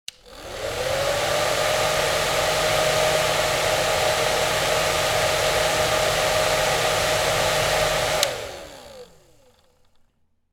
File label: Hair dryer running long; Household Appliances